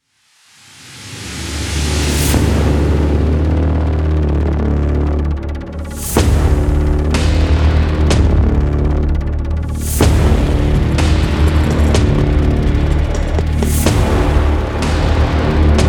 Main Ambient

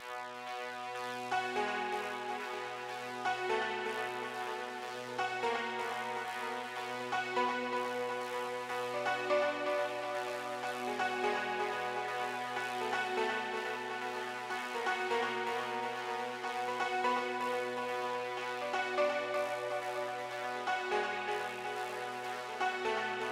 start music background; Equalizer

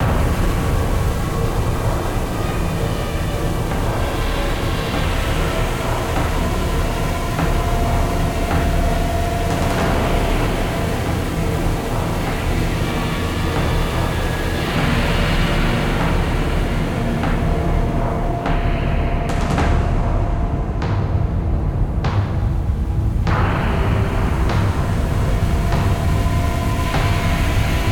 Air Filled Tunnels Heavy; Call of Cthulhu Dark Corners of the Earth

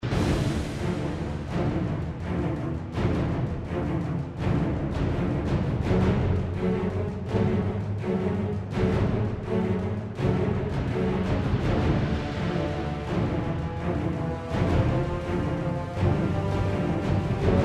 Combat Loop; batman arkham origins; android ios

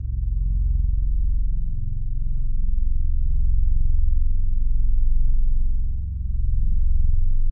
Combat; Subbase

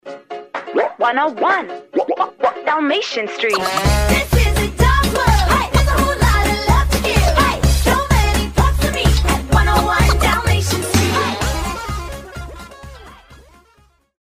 Victory Music; 101 Dalmatian Street Boom Night Rescue

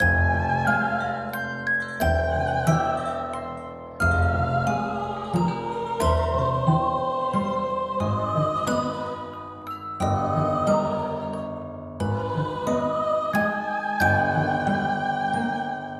Baby room theme; Toy Odyssey The Lost and Found

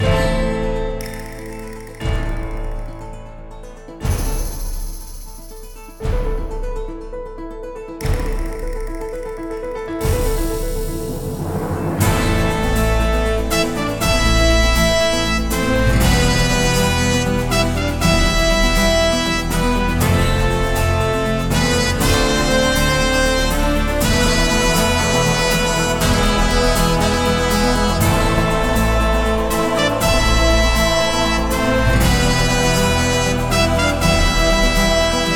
loop duel; Western

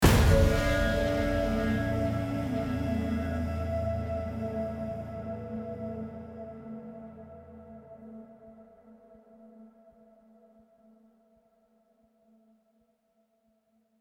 Small Robot End; horizon zero dawn

